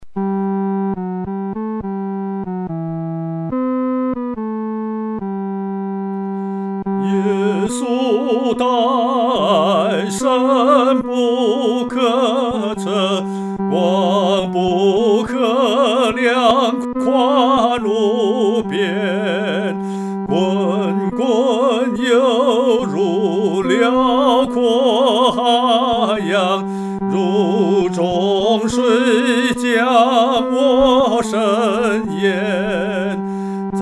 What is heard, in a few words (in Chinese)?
独唱 第三声